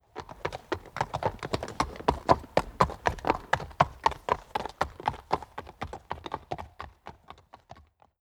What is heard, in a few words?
horses; ylearkisto; simutrans; Pak128.Britain-Ex